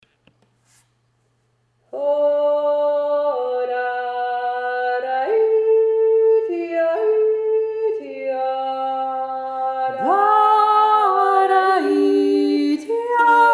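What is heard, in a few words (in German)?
zweistimmen bäuerinnenjodler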